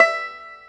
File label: banjo E5; AKAI S-Series CD-ROM Sound Library VOL-2